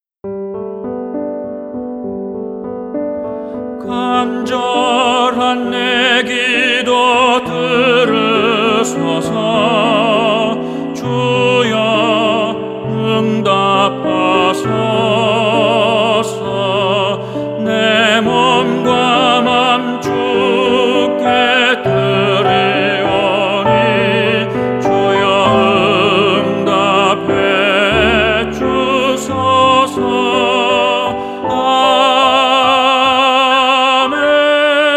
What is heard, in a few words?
TENOR